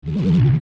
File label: Hurt; Phantom Ganon; zelda; OOT